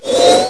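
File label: item back; Item respawning; Doom and Doom 2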